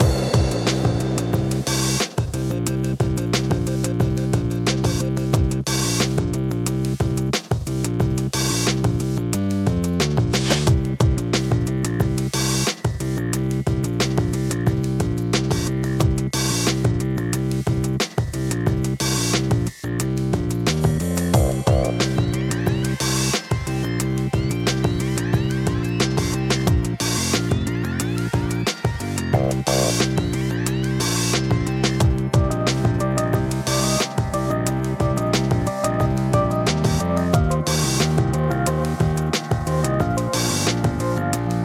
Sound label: ambience rewards; ReallyGoodBattle